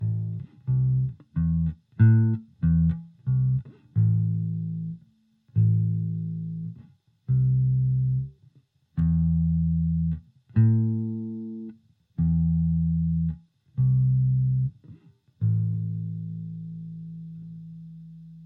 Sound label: A Minor Arpeggio, guitar